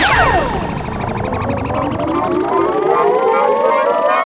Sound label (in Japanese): 溜め; ガイスールシドー溜め; super otousan